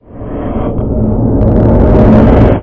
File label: planeswalker